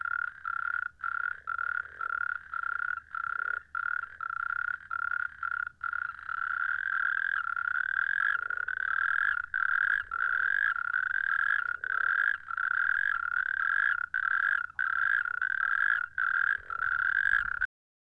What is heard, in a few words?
bufo, frogs